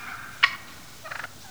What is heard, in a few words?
cluck n purr, turkey; hunting